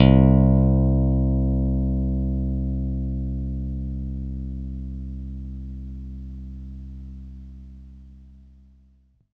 Bass C, Stick; Giga Samples Collection; BassSide

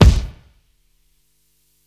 Kick, Psychedelic Drums

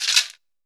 cabasa; Brazil

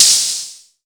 SPACE HIT; Best Service Dream Experience

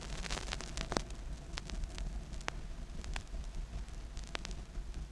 Crackle, Vinyl Noise

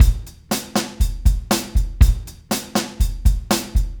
Motown, R&B Drums; Legacy; Drummers of Motown

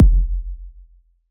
Kick Sub; ANTENNAS

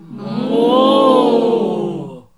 NOISE, CLASSIC VOX; Best Service; Hallelujah

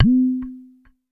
WD LO MID, DRM HITS; Spectrasonics; Liquid Grooves; WAVDRM HITS1

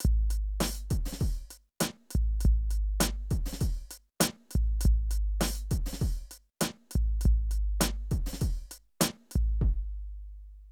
Drum loops, Hip-hop

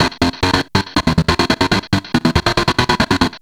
Treat Guit, Industrial Nasty

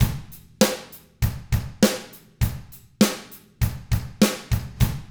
ROCK, Drums; ILIO; Double Platinum Drums 1

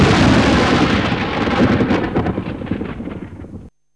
THUNDER; Zero-G Ambient 1; THUNDER+RAIN

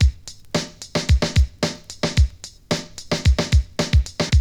BREAK; Zero G - Man Machine

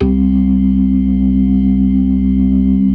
DRAWBAR VIB, Synth and Organ

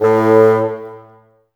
Bassoons, Woodwind; Miroslav Vitous - Woodwind Brass Ensemble

